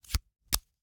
Hwatu, card game, hard plastic, small, clatter, pull, slap, throw down, single card, medium; Eiravaein Works; Vaeyan IV